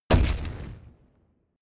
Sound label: wood stack bass; whooshes rises; hits impacts